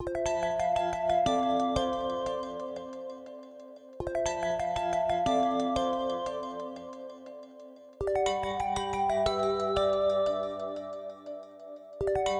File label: Wind, ringtones; Honor; X9c